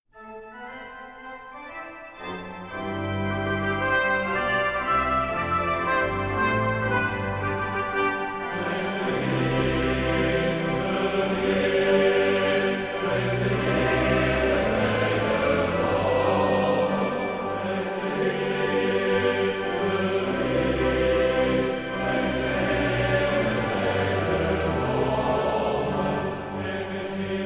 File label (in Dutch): Prijs de Heer, mannenkoor